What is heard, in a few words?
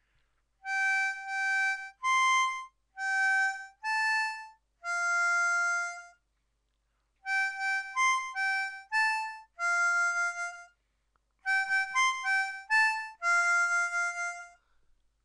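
Chorus Fill, harmonica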